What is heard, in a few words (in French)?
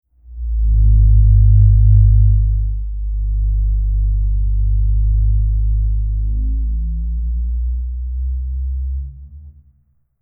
doigt peau